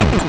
shot

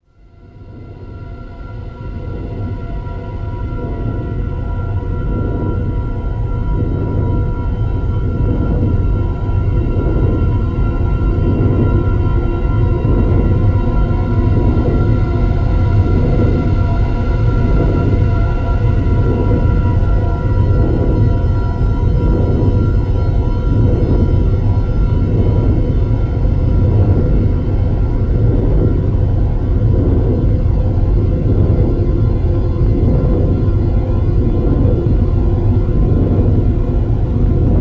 ambiance; russian sounds

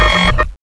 button; vendetta

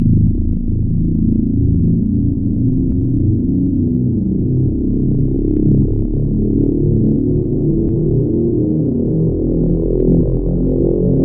portal charge; st mission

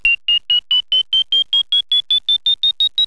bomb activate; alienshooter

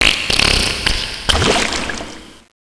fart; poke646

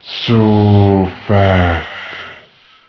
skeleton alert; monsters

